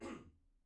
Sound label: clear throat; IEEE AASP